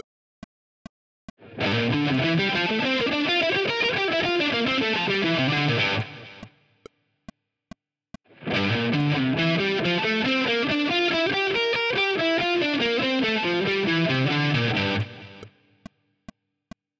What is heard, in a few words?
triad arpeggios, guitar